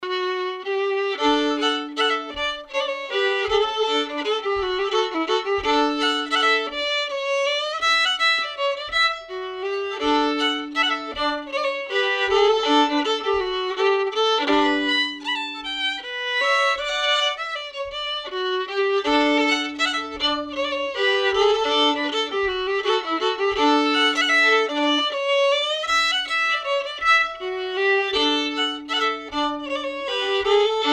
waltz, fiddle

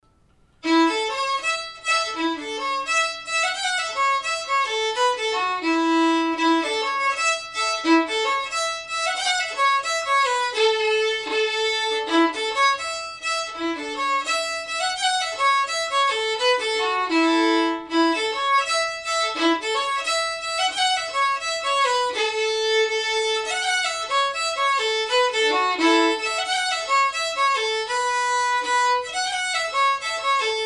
jig slow, fiddle; stellas

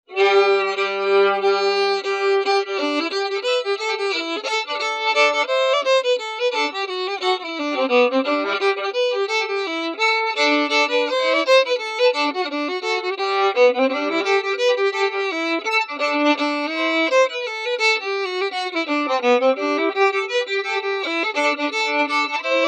slow, fiddle; Cauliflower

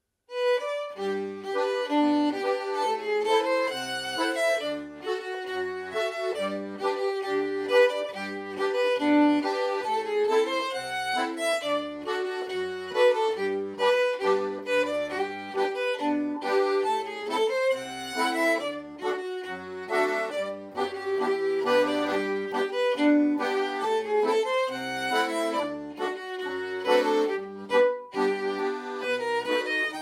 polkka slow, fiddle; Ampumakoulu

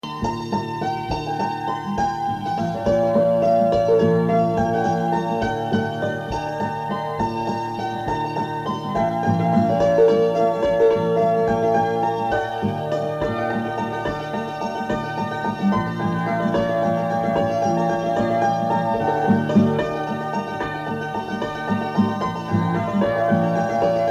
unnamed waltz; greatlakes

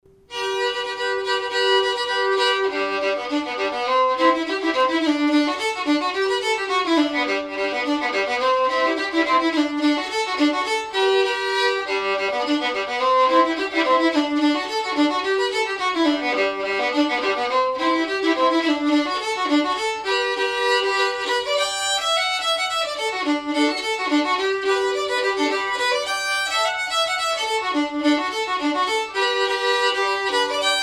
polka, fiddle; finseths g

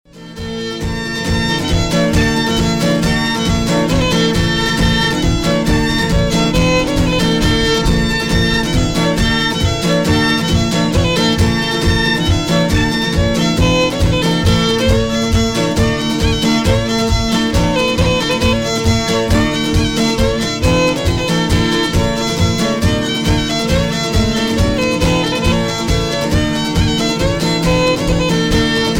Polka, fiddle; Sullivans Polka; Britches

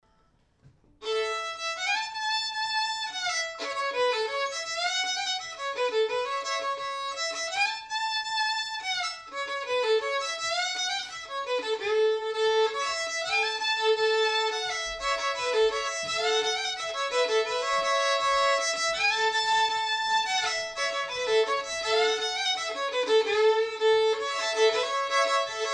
two step slow, fiddle; choupique

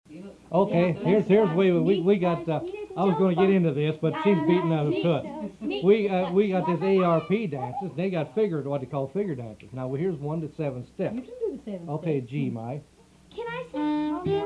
Seven Step; greatlakes

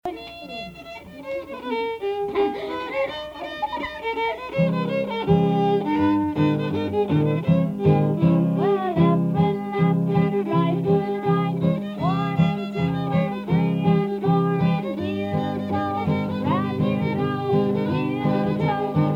figure dance; greatlakes

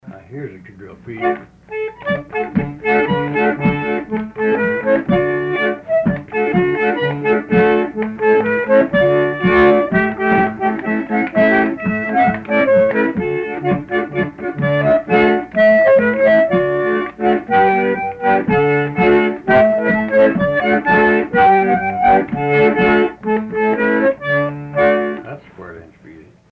unnamed quadrille; greatlakes; bloomington

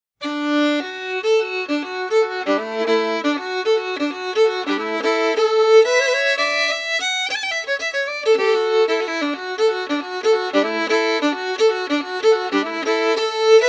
Polska; Saaren Edlan; fiddleclub; scandijam

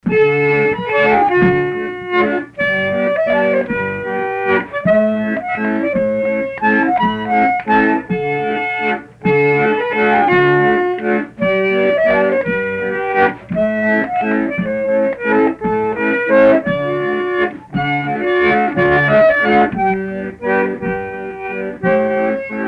Waltz; Lou Palmers Waltz; greatlakes; bloomington

